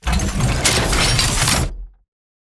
weapon draw; Titanfall 2

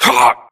grunt death neck; Titanfall 2

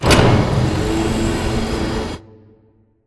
Solar Panel Rotate Start; Boomtown; Titanfall 2

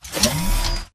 Stalker Mvmt Servo Damaged Light Medium

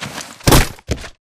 spectre curb stomp grunt; Titanfall 2

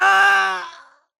grunt death crush; Titanfall 2

